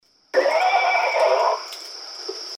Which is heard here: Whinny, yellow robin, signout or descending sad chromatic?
Whinny